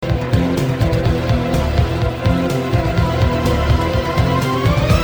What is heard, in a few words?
Game Tune